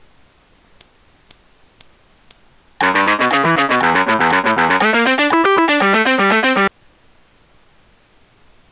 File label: Bluesy Phrygian, guitar